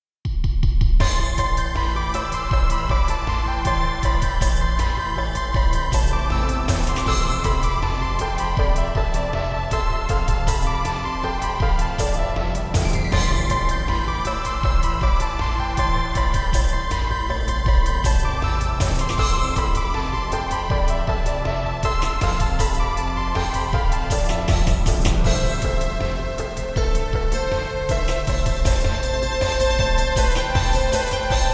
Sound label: Ambient ver. 2